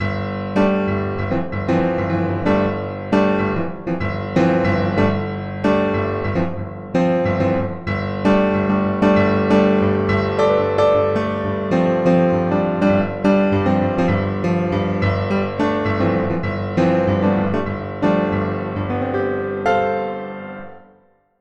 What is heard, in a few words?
K2 Nice metallic